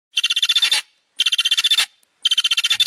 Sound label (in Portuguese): canto da galinha vulturina